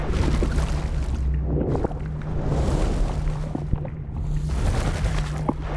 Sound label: aqudragon reanimated moving; Stellaris